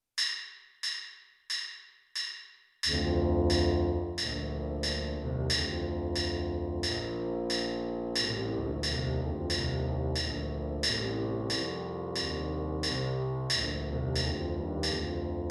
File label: tuba parts a b